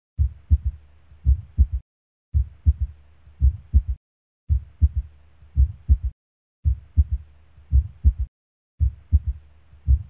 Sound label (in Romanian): sunet 3 fiziologic apex